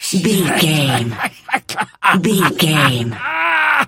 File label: voice male laugh